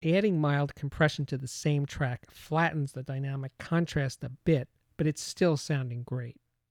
Drum Kit Dynamic Range Mildly Compressed Announcement; The Ultimate Headphone Demonstration Disc ( 24Bit192kHz ); Chesky Record